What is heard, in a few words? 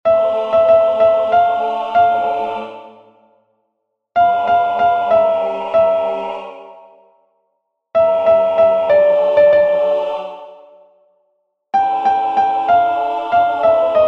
Soprano, choir; Sanctus